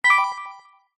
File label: game sound soft warm digital tone positive select